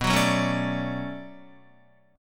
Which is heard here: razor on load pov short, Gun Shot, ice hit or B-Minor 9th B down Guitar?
B-Minor 9th B down Guitar